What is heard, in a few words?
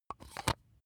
razor battery compartment close